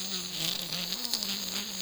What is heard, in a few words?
critter idle growling loop; nightmare criters